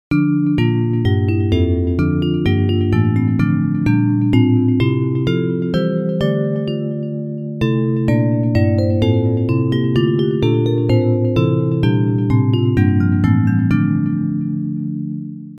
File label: Bells